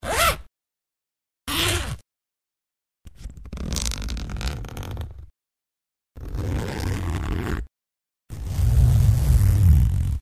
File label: zip